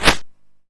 YM Swoosh; SFX; CHIPSHOP DELUXE; CHIPSHOP HITS

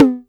Jomox Tom

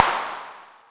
Vermona Snare; techno drum fx; Analogue DrumHits

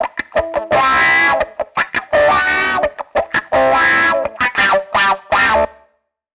Guitar line, 85bpm, hiphop; musicradar

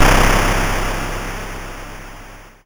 YM Noise; NOISES; ChipShop Deluxe; ChipShop Hits

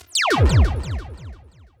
Stun, techno drum fx; Single Hits

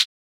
Closed hat, techno drum

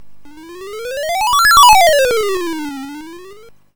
YM Ripple; SFX; CHIPSHOP DELUXE; CHIPSHOP HITS

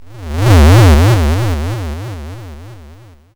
YM Wobble; SFX; CHIPSHOP DELUXE; CHIPSHOP HITS

